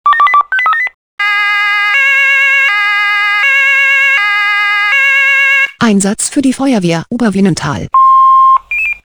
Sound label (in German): SMS Alarmierungstone; FW Oberwynental